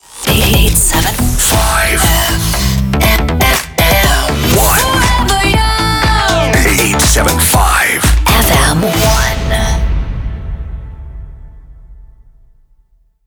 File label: Jingle 120bpm Am Main ID; 87.5 FM1 Manila